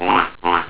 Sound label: duck